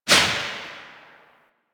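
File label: submachine gun Tail Indoor; Warframe; Tenno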